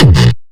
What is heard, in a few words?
bass kick